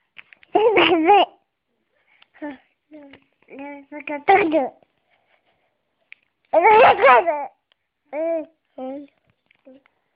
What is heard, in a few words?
Babys Message